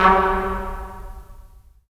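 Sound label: brass trumpets staccato, right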